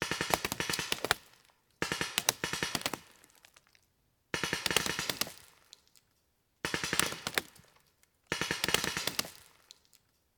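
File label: Paintball Gun Impact; Sound Ideas; Guns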